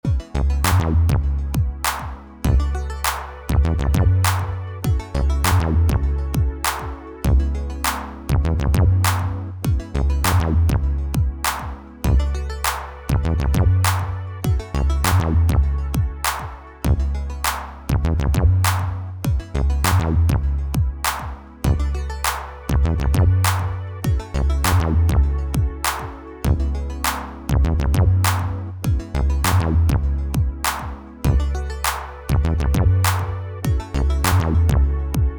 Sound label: Roland Dimension SYNTH Pure